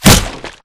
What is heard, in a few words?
imp bullet lightballistic headshot human; Titanfall 2